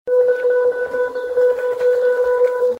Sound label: school bell; woodlawn